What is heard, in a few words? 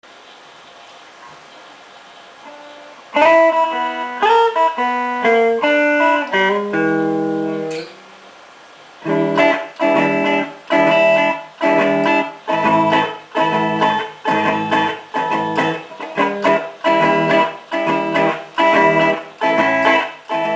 blues em E